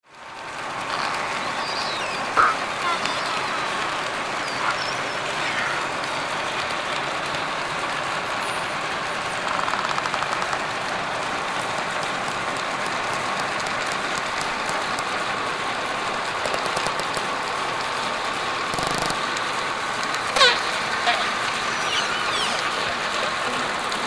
Orca Calf; Vashon

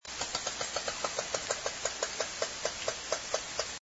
clicks fast slow, orca

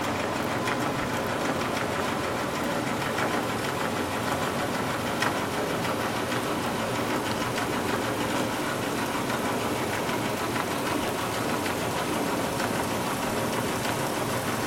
bulker, vessel; 2017 slow down; SRKW vessel case study

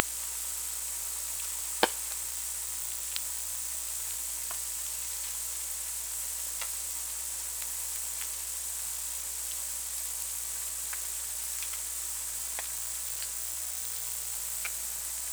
SONAR

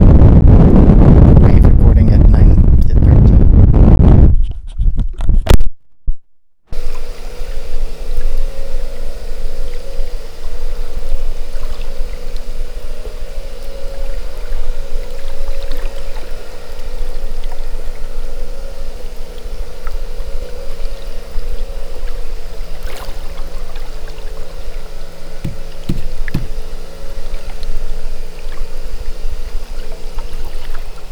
Soundscape recordings, powerboat no shrimp; whales of guerrero

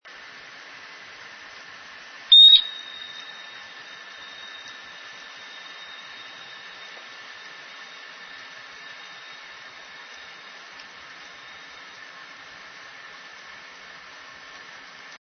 loud sonar

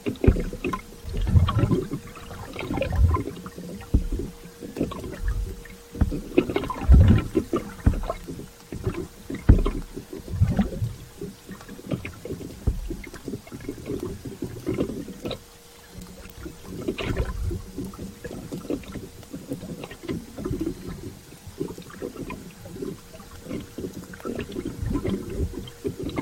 SRKW, faint challenge bouts, biophony

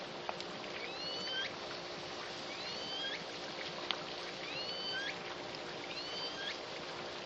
Lpod 4 calls midnight after ship noise; SRKW; calls and vessel noise; biophony

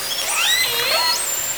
call S2, Orca Calls; SRKW; biophony

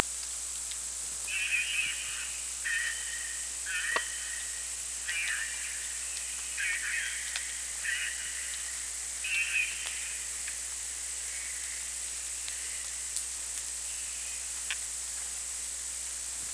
SONAR

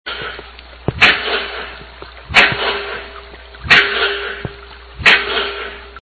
pile driving

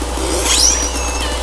call, Orca; SRKW; Alwin; S38

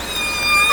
call, Orca; SRKW; Alwin

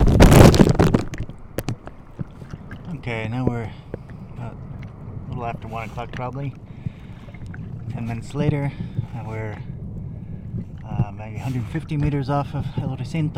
grunts; Soundscape recordings; whales of guerrero

